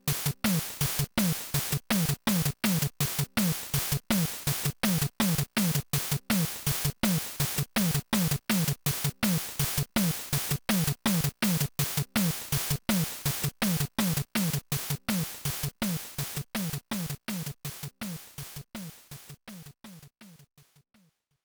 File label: Stereo, Transition Beat; super spy hunter; nes